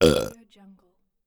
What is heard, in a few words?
male burp